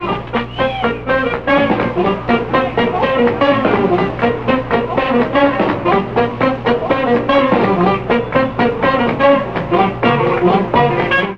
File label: Bugle Riff